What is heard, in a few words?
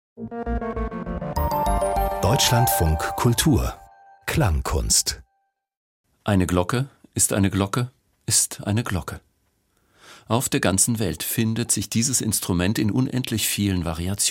voices and bells breathing in communion